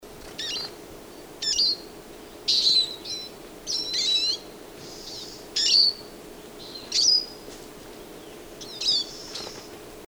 Eurasian Siskin; Carduelis spinus; call

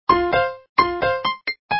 piano

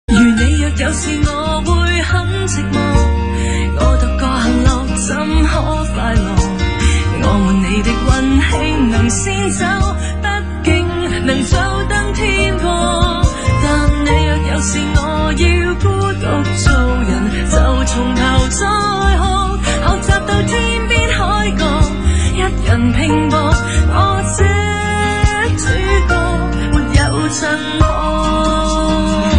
mp three ringtone

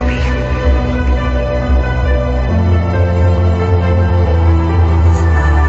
melody

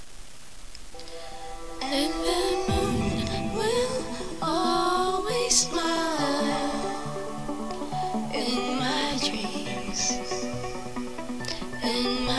argh

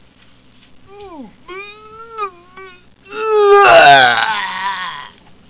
barfing

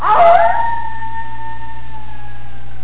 wolves

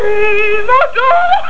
sing

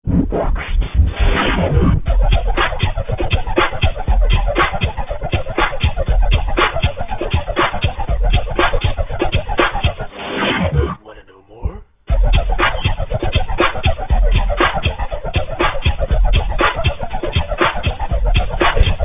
techno

